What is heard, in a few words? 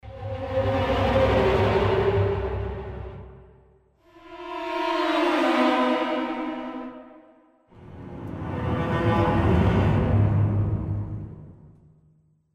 Instruments Strings FX Falls